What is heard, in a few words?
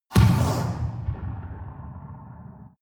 rpg shot distant